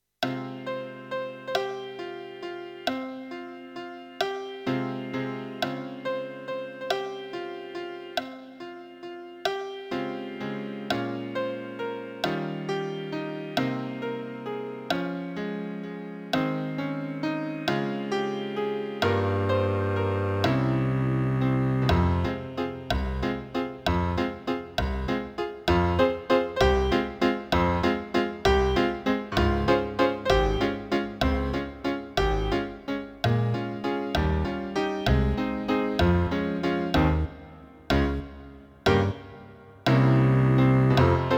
Piano

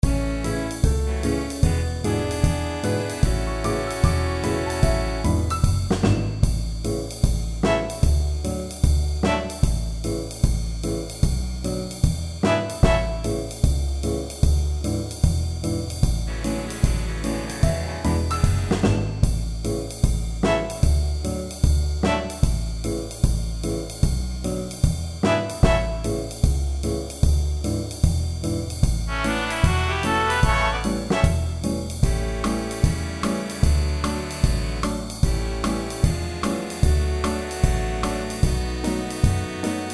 Backing; Santa

